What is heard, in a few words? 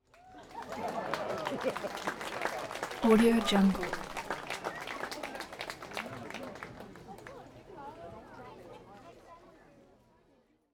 parade applause; collanderson